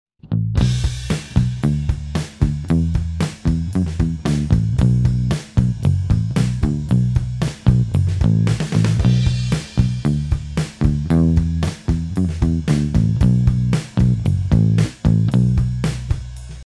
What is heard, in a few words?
Jag Muted Pick